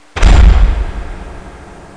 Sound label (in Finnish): pamaus